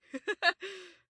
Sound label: female laugh inhale; MISC and SPOKEN WORD